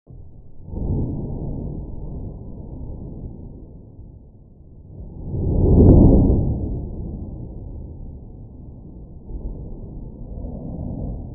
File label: sounds of war, battle cries; sounds of war in 1000